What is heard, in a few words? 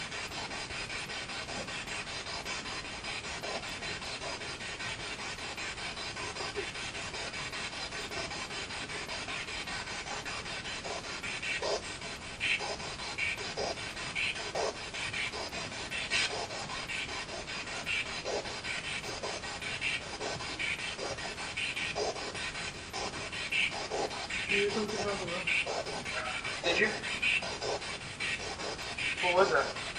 Balcony Spirit Box